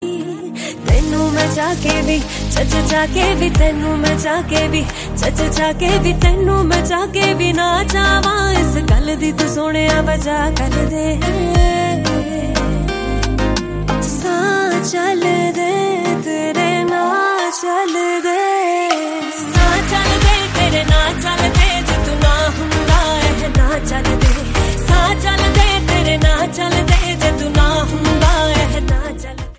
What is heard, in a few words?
punjabi Ringtone